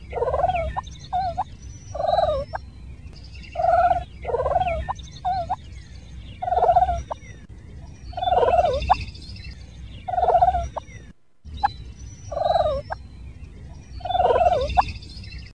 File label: turkey purrs; animals